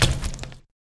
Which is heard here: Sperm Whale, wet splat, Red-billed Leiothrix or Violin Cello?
wet splat